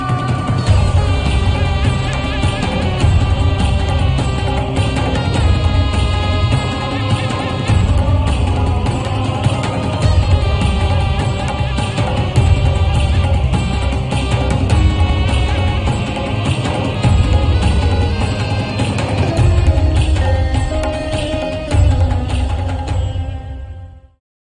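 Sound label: intro background music ringtone; Aashram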